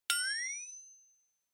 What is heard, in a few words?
Flexatone